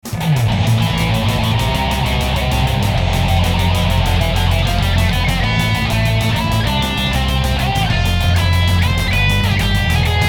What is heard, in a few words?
heavy metal